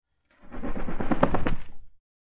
Bat, Fruit Wing Flaps. Low Pitched Flaps Of Bat Wings. Medium Perspective.